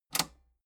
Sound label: metal button robust spring release disable